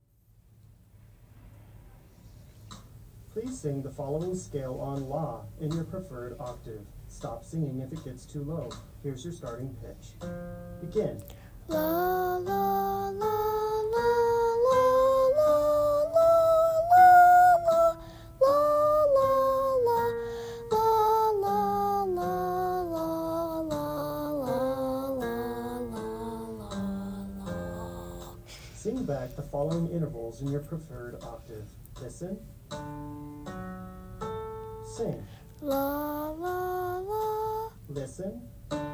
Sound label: Soprano; Audition